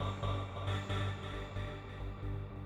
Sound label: SYNTH PAD, INDUSTRIAL; Sphere